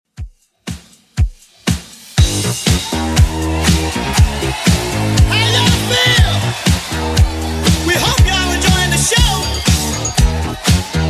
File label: 80s Redrum 120bpm